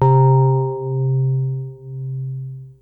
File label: PPG C2, Rare Synthesizer; Hollywood Edge; Giorgio Moroder Rare Synthesizer Collection; PPG.2.3